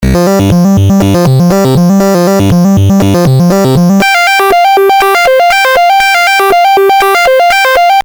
arp smooth S+H

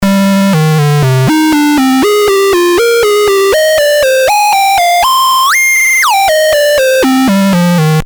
modulated square lead

